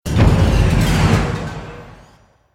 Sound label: Stinger Action Short